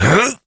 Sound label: Jump Adult Operator; Warframe; New War